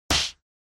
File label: Hit